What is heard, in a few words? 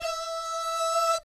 DOO SFZ, Vocal Jazz Fx